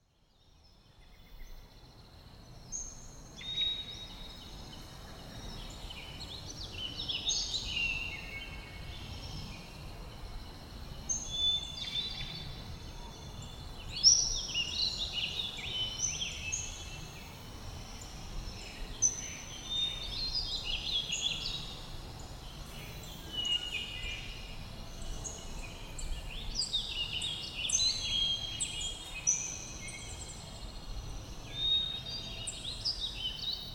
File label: Ingame Garden Pads